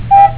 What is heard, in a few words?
PIPE